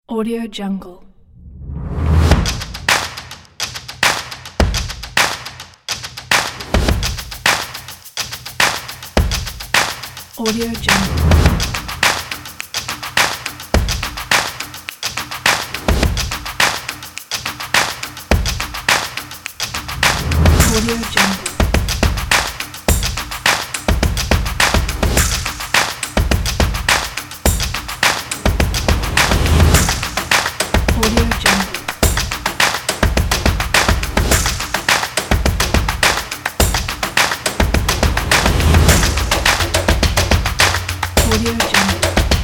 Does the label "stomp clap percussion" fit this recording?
Yes